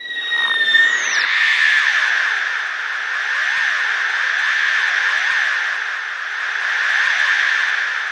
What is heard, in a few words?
AQUA MODEM; Distored Reality